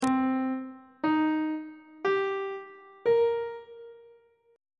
c phrygian 7th chord 1 is c minor 7th on treble clef